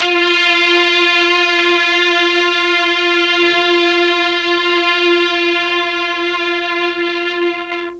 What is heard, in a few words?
F2 16 VIOLIN, Mellotron; Mike Pinder - Mellotron Archive CD